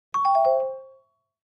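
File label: Clavichord Short Major; keyboards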